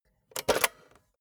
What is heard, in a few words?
cassette player slide tray take out soft